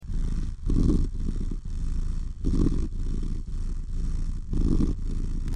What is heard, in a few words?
Cat purring